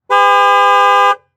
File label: Transportation Truck Envoy Honk Horn Long